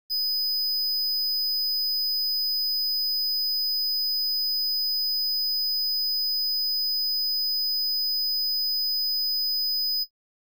Sine and square wave spot frequencies, 5 kHz square wave